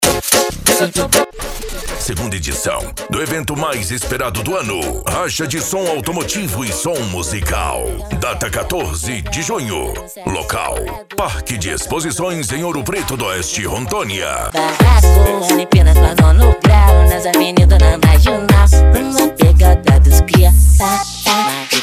racha de som automotivo e som musical

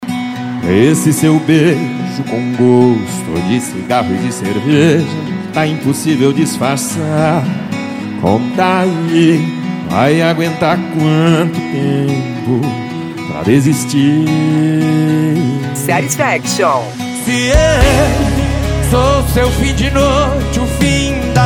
sertanejo

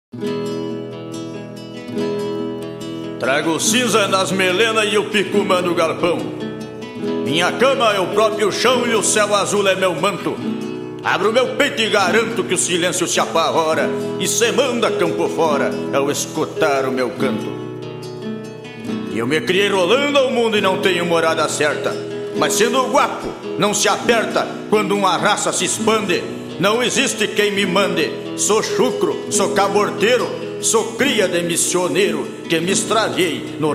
batidão gaúcho sem vinheta

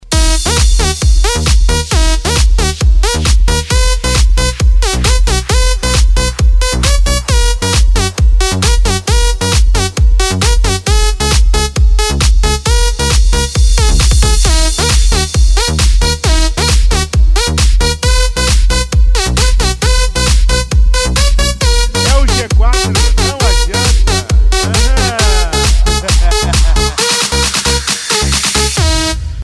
eletro e viola especial de eletro funk

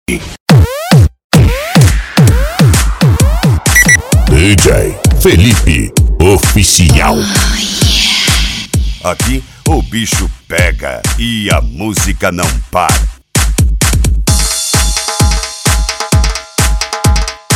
eletro funk pancadao

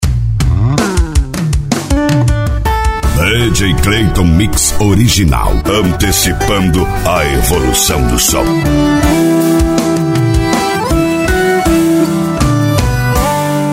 sertanejo universitario e sertanejo pancadao

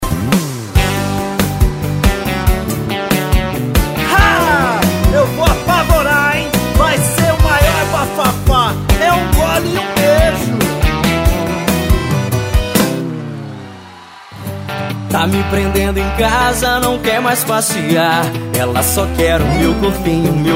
arrocha, sertanejo

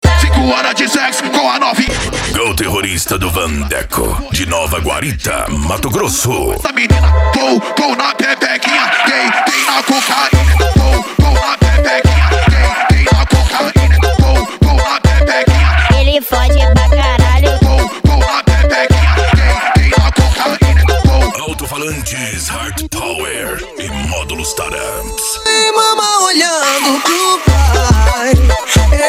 dance e funk mega bass

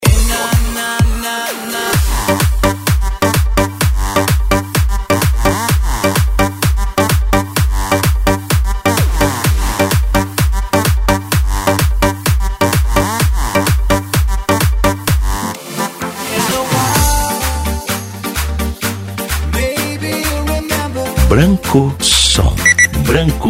dance e funk retro; branco som

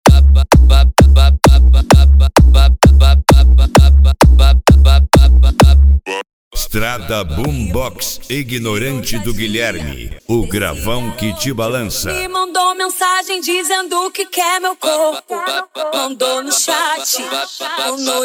esp funk atack

